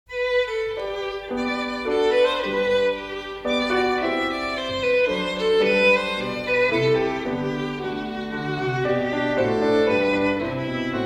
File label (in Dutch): Rondino allegretto G viool piano